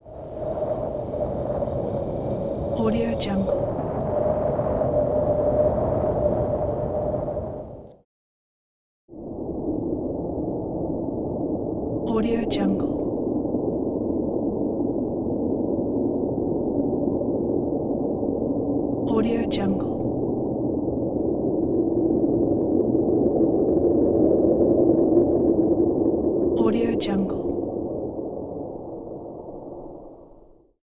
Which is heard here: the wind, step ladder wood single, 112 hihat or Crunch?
the wind